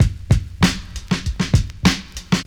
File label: 98 bpm 00s breakbeat g key, Free drum groove - kick tuned to the G note. Loudest frequency: 1140Hz